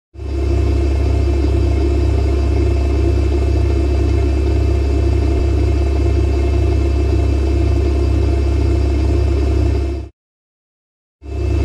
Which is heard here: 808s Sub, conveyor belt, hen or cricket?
conveyor belt